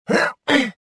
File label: jump, rodian